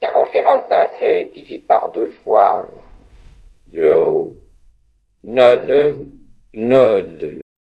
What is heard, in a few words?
jingle